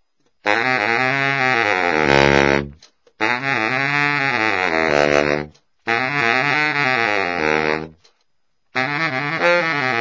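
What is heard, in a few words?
arpeggio, baritone; gold medal ii; lacquer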